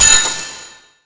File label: gold heart